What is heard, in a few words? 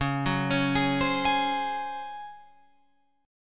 C sharp Major 7th Suspended 4th C sharp Guitar Open F; C#M7sus4; arpeggiated